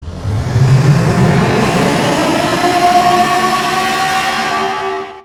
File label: roar; miraidon